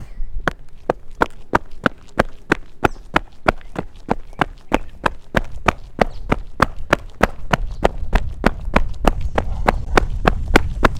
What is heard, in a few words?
Running On Street